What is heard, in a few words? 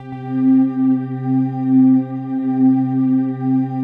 pad, atmo pads; Best Service; XX Large